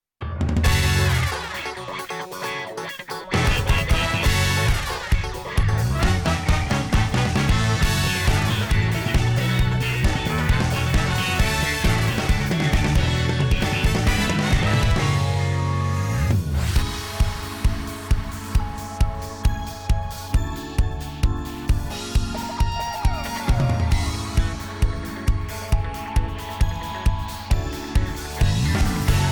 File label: inst